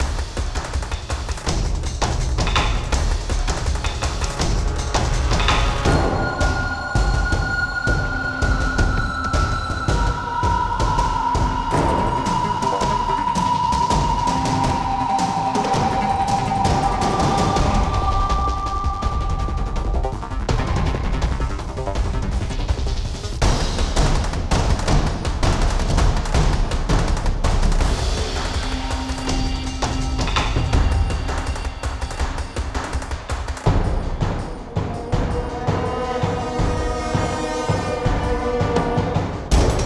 Remix; Driver Renegade

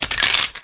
Skeleton

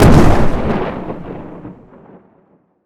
Explosion Med Long Tail; jasem just another shooter with electronic music windows 2017

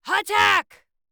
Attack; out of reach treasure royale 2020 gamerip pc

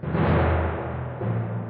Tymp

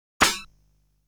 Sound sniffing with a shield

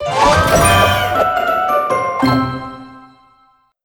Crunchy Finish

